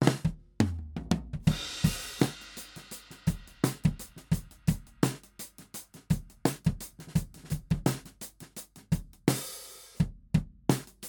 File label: drum overhead mics XY